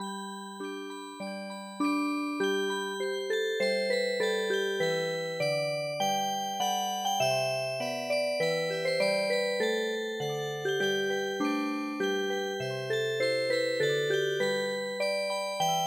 Music Box, traditional

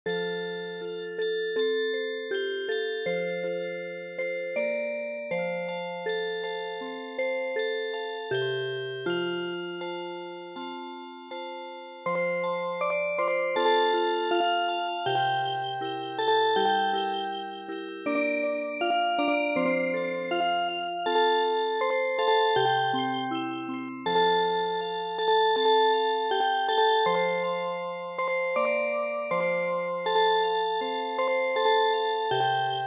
Cradle Song Music Box

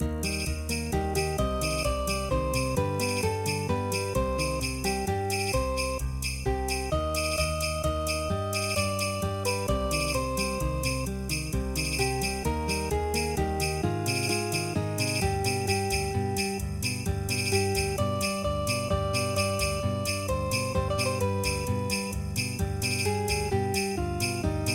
flamenco; La Sandunga

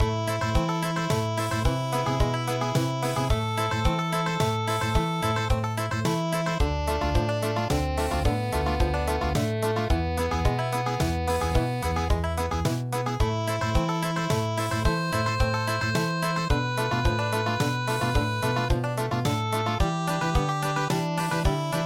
Country

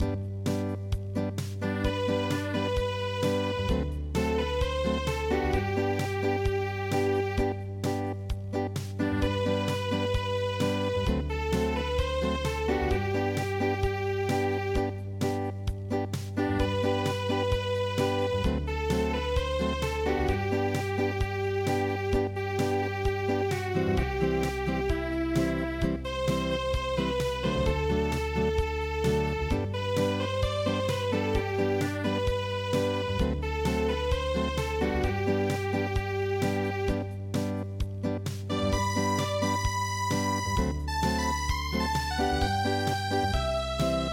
Acoustic, traditional

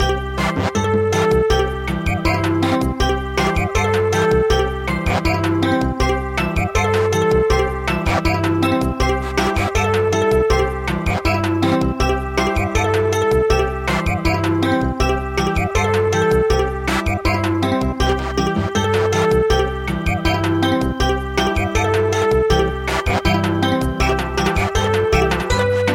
Samba, midi

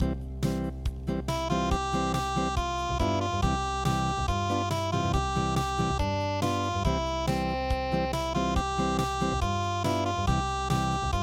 unpluged

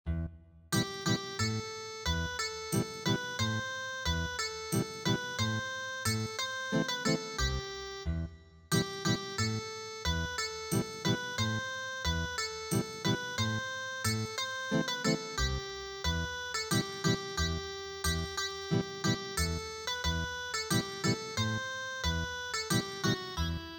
Ethnic Guitar

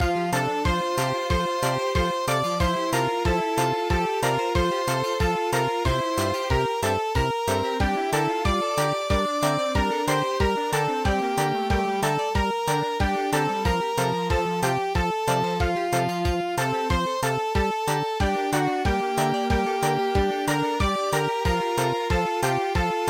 parade

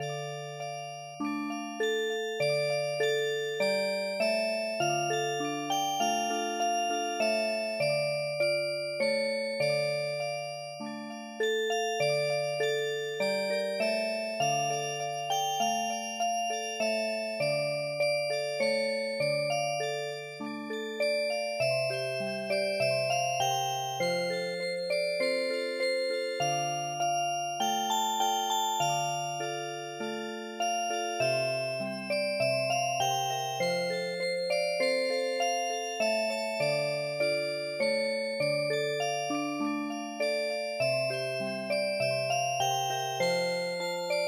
March Music Box; midi; traditional

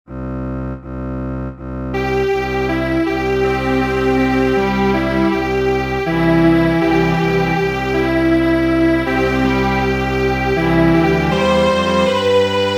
Wiegenlied Strings Quartet, midi traditional

